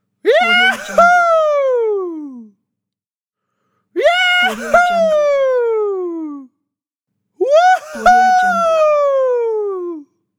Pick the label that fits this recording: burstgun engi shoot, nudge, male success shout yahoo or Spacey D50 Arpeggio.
male success shout yahoo